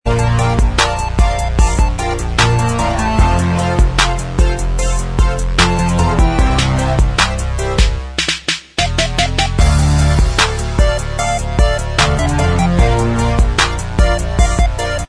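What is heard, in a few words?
instrumental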